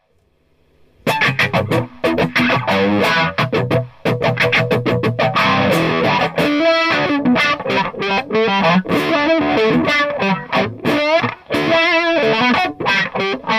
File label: BST WAH